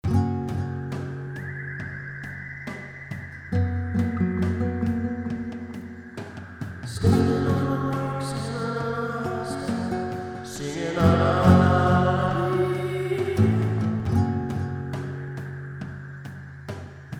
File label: Medicine Chant